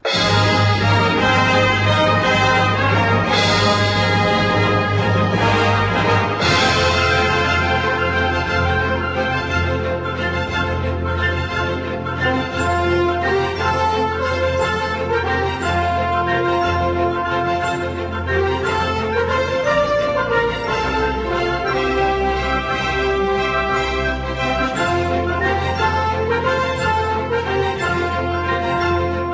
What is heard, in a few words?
Trap